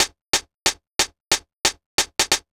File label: 91 DRM SND, Pure Electro